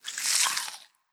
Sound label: Bolt Move